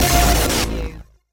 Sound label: Hit Glitch